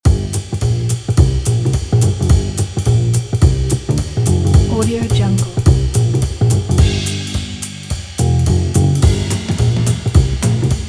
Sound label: jazz